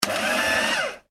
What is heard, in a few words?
office paper shredder short burst; Paper Shredder Operates - Short Burst Of Power; Materials; McKinney Sound